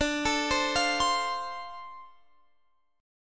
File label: D-Minor 7th D, chord; Dm7 arpeggiated; x,17,19,17,18,20